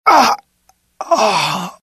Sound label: death, pain; mohaa; dialogue